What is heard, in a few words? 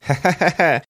light laugh